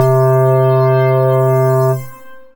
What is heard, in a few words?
Piano & Keys